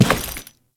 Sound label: Step Default, Footsteps; Alad V